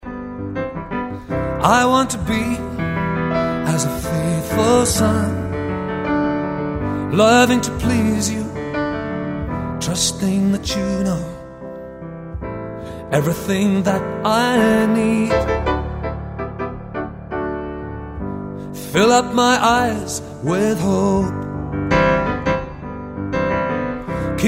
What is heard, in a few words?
Piano